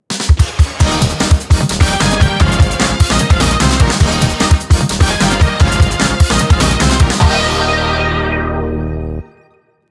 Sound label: JINGLE Act Clear; sonic and the fallen star